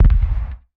damage hits explosions, Warships

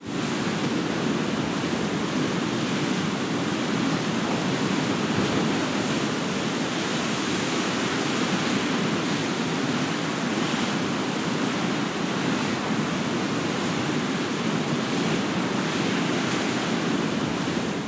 ambient battle; World Of Warships

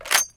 rem700 bolt; Escape from Tarkov

